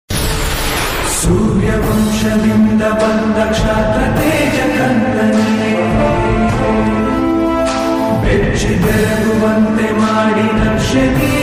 Kannada ringtone; kurukshetra movie; karna entry song; arjun sarja

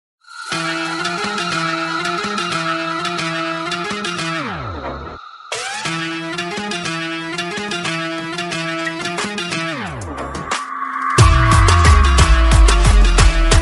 instrumental bgm ringtones

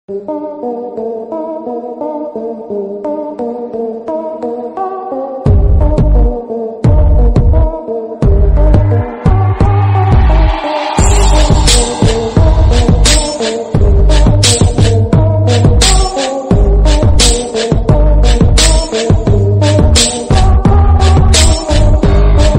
bgm ringtone, horror music; revenge

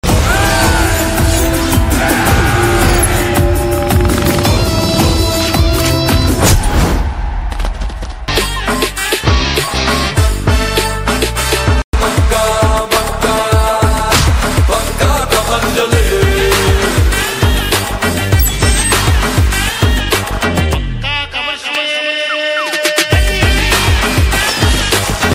teaser ringtone; pakka commercial; gopi chand

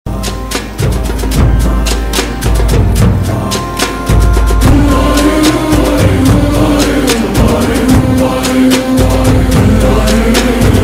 movie bgm ringtone; acharya; ramchan; chiranjeevi

